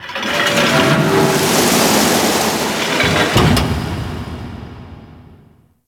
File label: Ocean Hatch Large; Warframe; Grineer Ocean